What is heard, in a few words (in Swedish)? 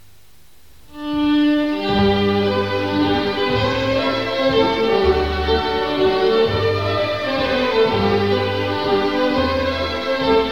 polska; Tierps